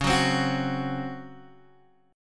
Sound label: C sharp Major 7th Suspended 4th C sharp Guitar Open G